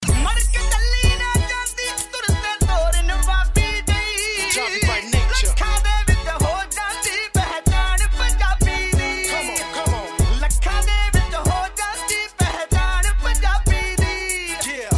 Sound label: Punjabi, Street Mixes; UK Punjabi